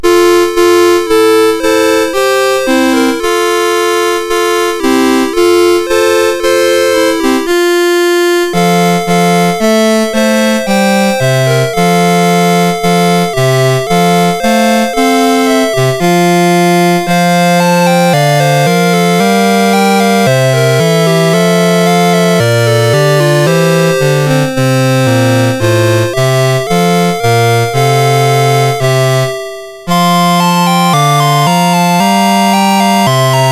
Angels we have Heard on High, MOS6581R4

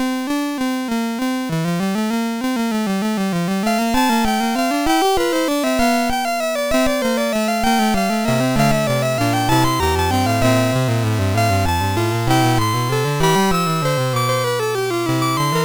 Fugue, sid MOS6581

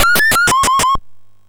sid MOS6581R4

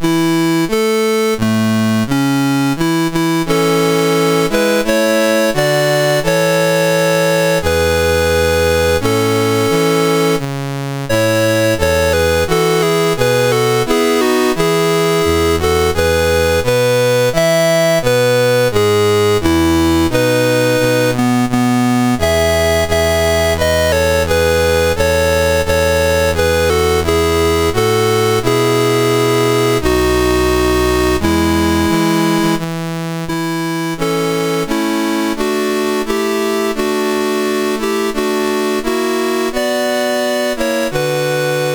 Denmark, National Anthems, MOS6581R4

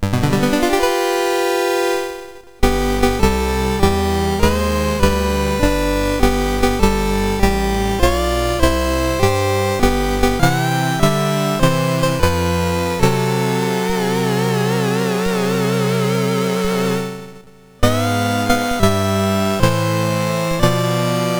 Happy Birthday MOS6581R2

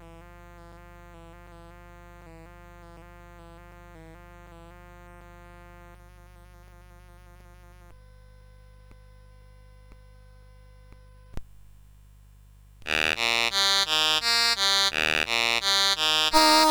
Hymne, CSG8580R5

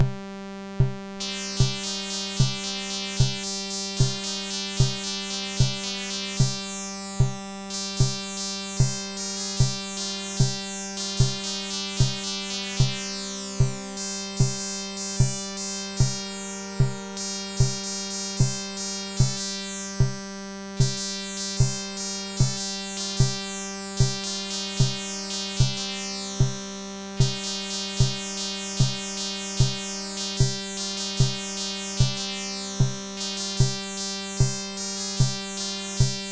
MOS6581; MOS6581R3

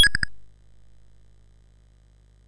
sid, MOS6581R4; Classic Concentration

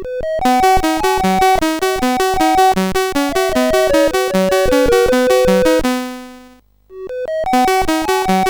BASIC sid, CSG8580R5; Baji

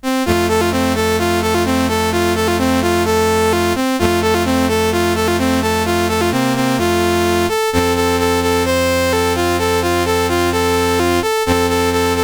reveille, mus CSG8580R5

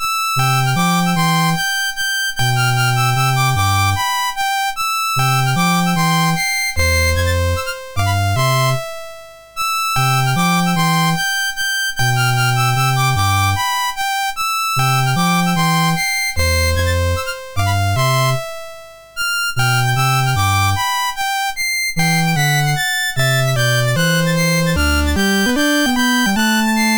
Allegro, MOS6581R3

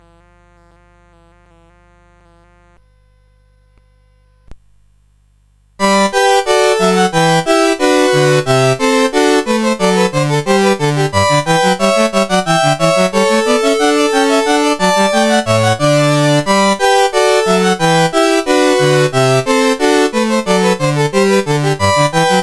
Gavotte in G Major, CSG8580R5

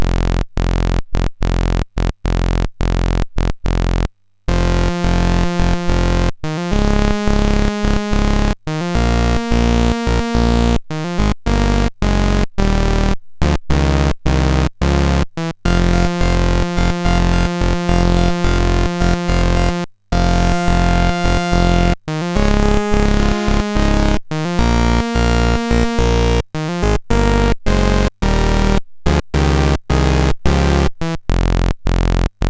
sid, MOS6581R4; Escape from New York

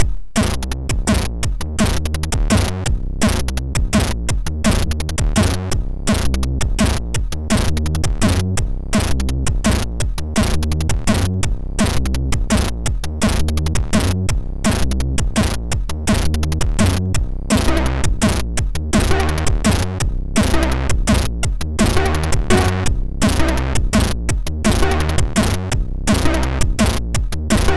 DNB, sid MOS6581R2; LEK

